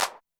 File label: clap, Zoom MRT-3B